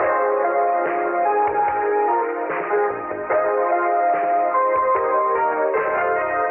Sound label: keys, phonky loops